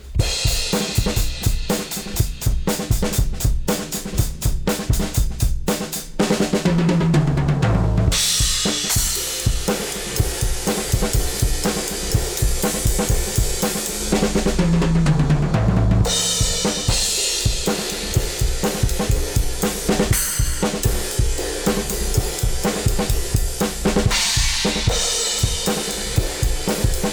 drums

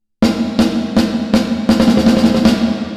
Snare; Reverb Compare; Reverberate3

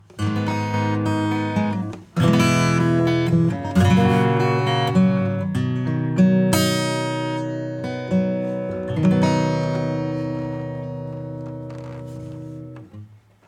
Acoustic

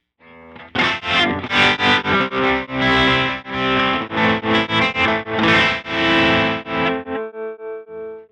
Moogerfooger MF 102S LFO sine slow

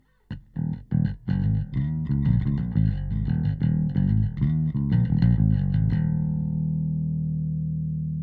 bass; Lindell 50 Series

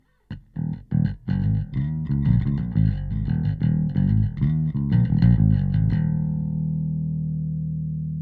bass; preset E Bass

